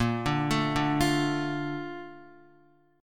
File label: Bb Major 7th, Bb, down, Guitar; BbM7 arpeggiated; JGuitar